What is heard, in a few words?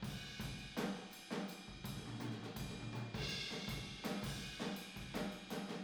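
Boogaloo Fill Room stereo, 82 BPM; Sonic Foundry; Drums from the Big Room The Mixes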